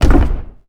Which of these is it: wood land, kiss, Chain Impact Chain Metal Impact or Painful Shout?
wood land